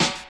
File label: good steel snare drum f sharp key